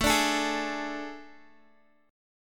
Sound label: A Major 7th Suspended 4th, Guitar, DADGAD